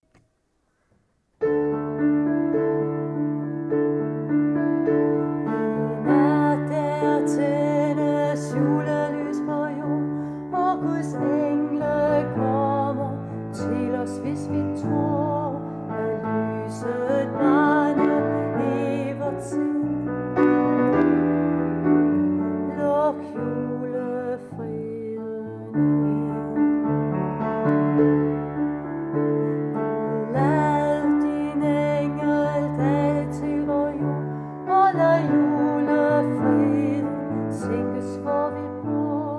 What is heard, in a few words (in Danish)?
Luk julefreden ind alt